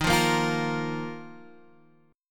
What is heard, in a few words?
Dsharp Suspended 2nd Guitar Standard; strummed; chord; x,6,3,3,6,6; JGuitar